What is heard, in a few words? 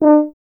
BRS TUBA, Solo Instruments; Miroslav Vitous; Solo Instruments VOL-2